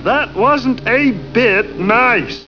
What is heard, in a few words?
pain; Alien Arena; marvinmartian